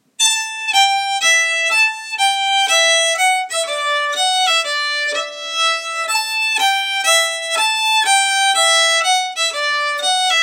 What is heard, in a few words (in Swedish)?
polska; fra n skorped